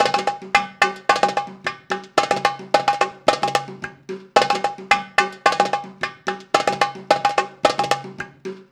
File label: PERLP, ArabianTraditions